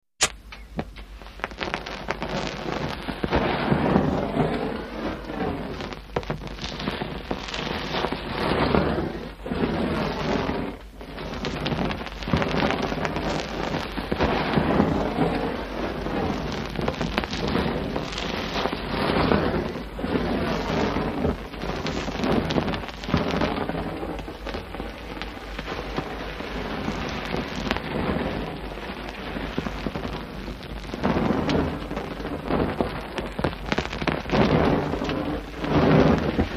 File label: Hand Held Torch Fire; torches